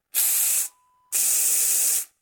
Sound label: spray